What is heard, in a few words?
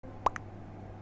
chat message; among us